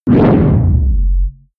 whoosh distorted rip bass

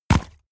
horse step water shallow

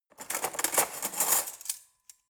cutlery forks drawer grab soft

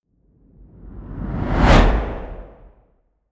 whoosh

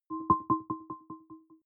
button echo chord

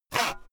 saw use short

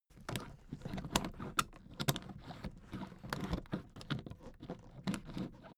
suitcase handle handling

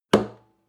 western guitar drum single clap

